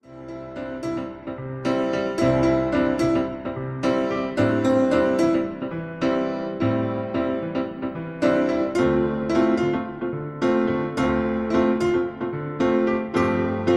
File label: Piano